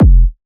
Techno, Kick; Techno Sounds 2024

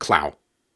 Syllables and Imaginary Words, Viking Vocal, Hard

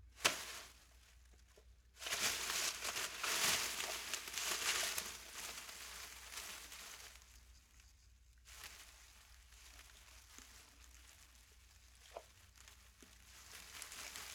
Rustling Branches and Leaves; Fairlight Sound Lib

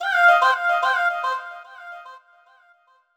Flute G# 110Bpm, House; Ghosthack Advent Calendar; House Kit; House Construction Kit

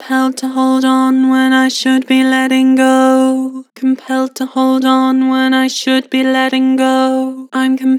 Compelled to hold on, Vocals; Harmor

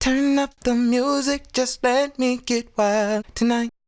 Sung Phrase Dry, 130 BPM D# Min; Turn Up; Cymatics; Euphoria Vocal Sample Pack

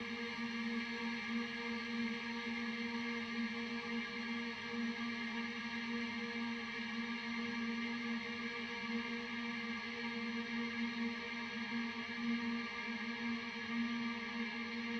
128 BPM D# Min Ambience; House; Loop Stems; Cymatics; Breakdown Loops

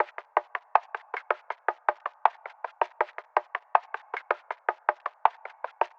Transient Perc Loop - 80 BPM; Cymatics; Fiji Hihat Loops & Percussion

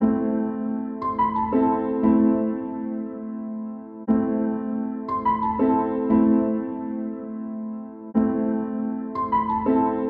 118 BPM A Min Piano, melody loop stem; Trap; Loop Stems; Cymatics; 2022 Melody Collection; Trap Pt 2; In The Cut

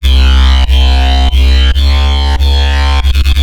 Bassline, Dubstep and Trap

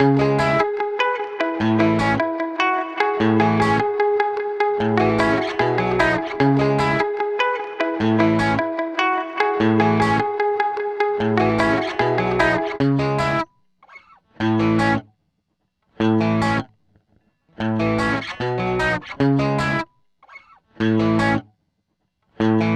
150 BPM C# Min, Guitar Loops; Guilty Pleasures; Cymatics; Journey Guitar Loops

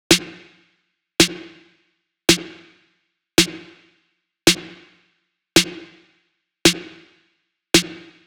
Drum Loop - 110 BPM Snare; Experimental Drum Loops; Loop Stems; Cymatics; Mystery Pack Vol 4; Weekend Drum Loop

